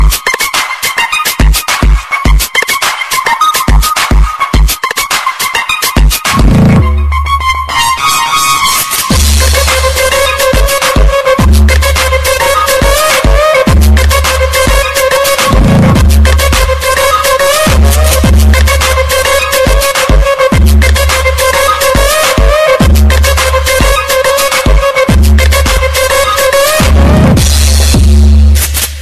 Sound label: Subwoofer